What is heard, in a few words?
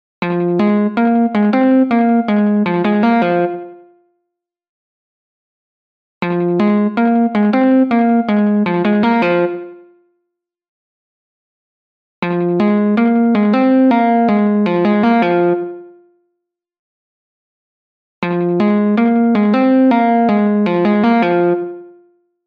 Guitar